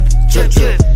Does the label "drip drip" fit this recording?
Yes